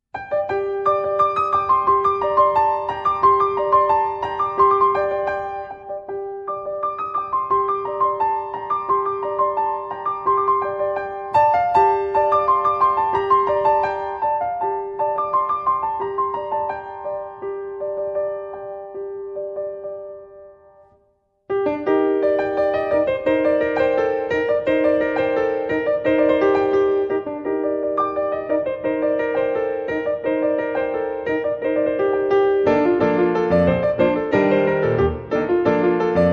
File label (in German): Allegretto mesto, Konzertpianistin; Constantinidis; Dodekanes